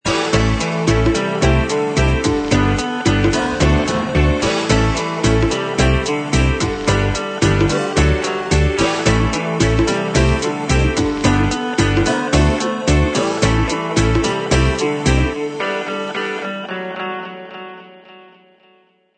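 Funny Children Dance Logo, 超级积极的儿童流行舞蹈曲目，这首曲目包含许多乐器-从明亮的合成器和吉他独奏到舞鼓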